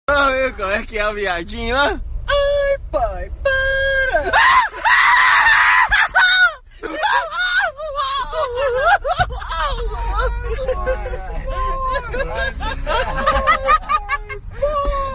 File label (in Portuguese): dando risada